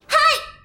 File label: menu hit; osu!; Luminance - Fluorescence; Shinobu Sounds